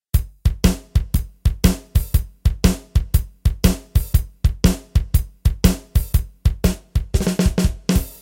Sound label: drums bass